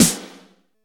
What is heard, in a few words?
large room steel snare drum sample a sharp key